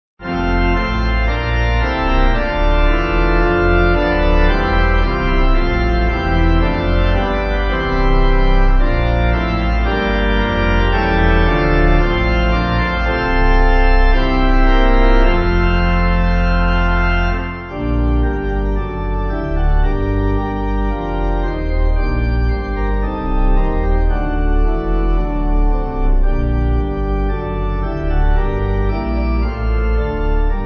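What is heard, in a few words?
Pipe, hymn backing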